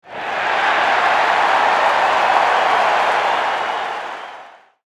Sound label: Crowd Cheer Baseball